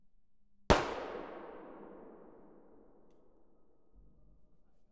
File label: Shotgun; uqad 10 db vid 60 til 300 hz